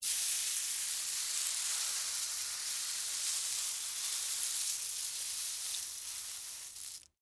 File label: Movement Cereal, Texture, Movement, Cereal, Corn, Flakes; Food (Sound Effects:Household); BlastWave